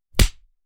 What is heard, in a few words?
Fight Impact; SoundsCrate